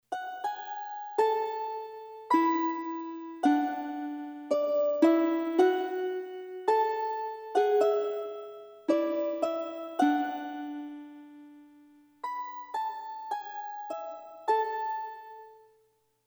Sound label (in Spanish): Arpa